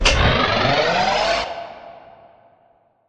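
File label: Assembly Plate Lift Up Rock Distant; Boomtown; Titanfall 2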